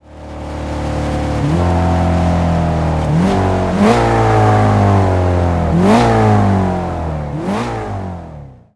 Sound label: throttle off, vehicles m6; gmod; tdmcars